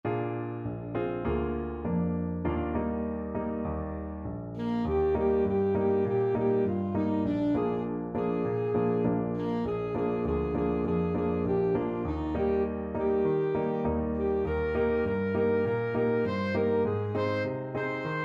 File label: ASAX, saxophone